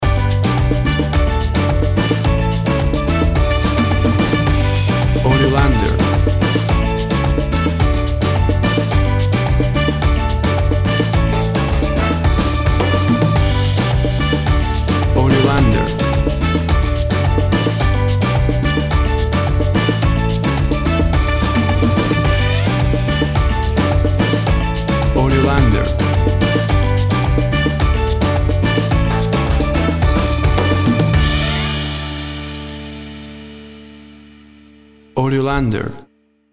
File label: Upbeat Uptempo Calypso Summer Music